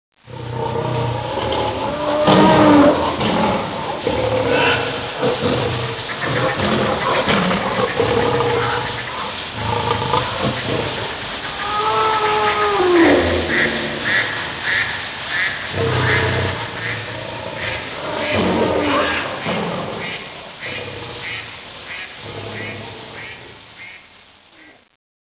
JUNG BACK, ANIMALS

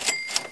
CHING

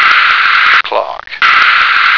clock

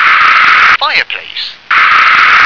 fireplace

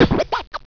spit

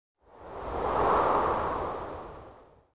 WND GUST

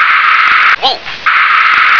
wolf

